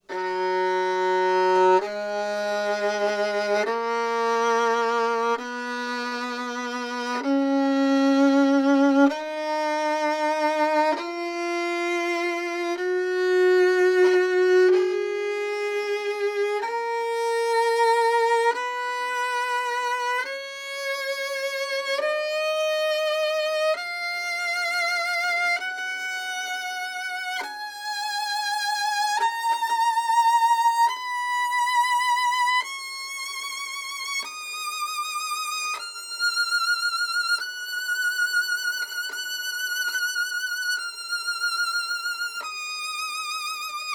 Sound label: scale